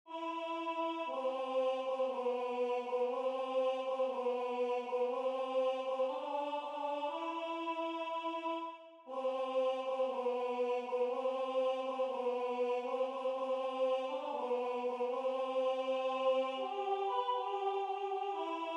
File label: Alto